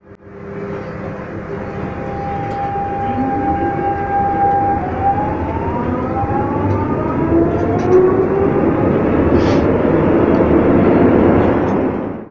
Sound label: train depart in